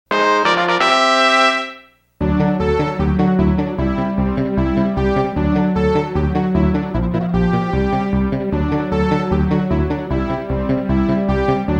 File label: Level Up